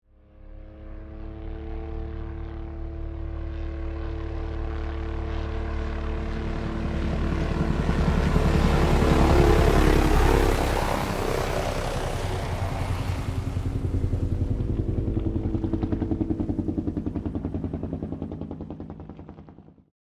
Police helicopter overhead flying overhead and away